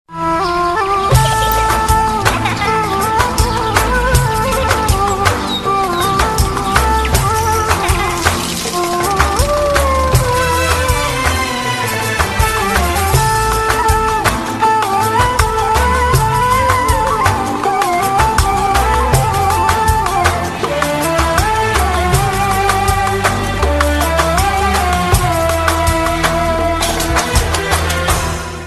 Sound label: arabic mix tune